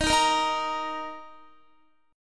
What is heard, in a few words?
D sharp 5th D sharp, Guitar; strummed; x,18,15,15,15,18; JGuitar